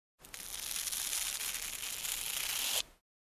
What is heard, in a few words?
Loosen Strap